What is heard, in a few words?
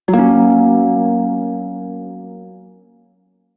Prime Shawzin Hirajoshi Chord J; Music; Warframe